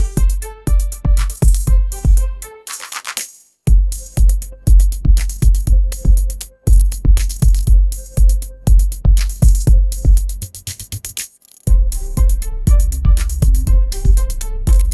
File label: upbeat pop melody; ai